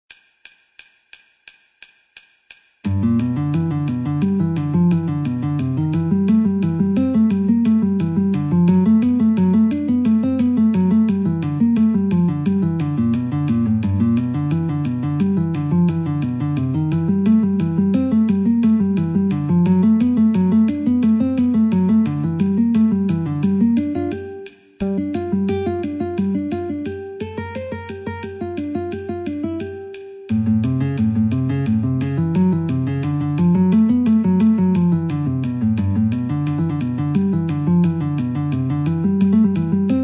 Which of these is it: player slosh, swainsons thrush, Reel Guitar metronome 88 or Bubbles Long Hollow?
Reel Guitar metronome 88